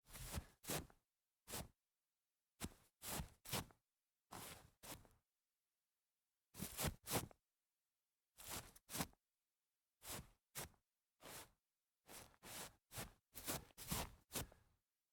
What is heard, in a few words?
shuffling feet grass